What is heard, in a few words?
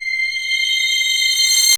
Ambient Atmos, Dance Synths